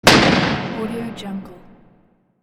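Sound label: cannon shot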